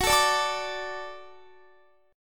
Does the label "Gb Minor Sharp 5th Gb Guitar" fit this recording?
Yes